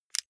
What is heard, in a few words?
lighter electric release